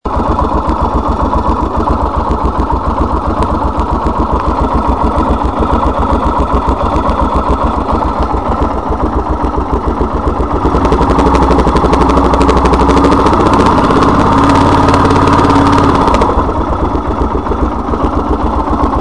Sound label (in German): tickern, Yamaha XT600; Ventile kein Spiel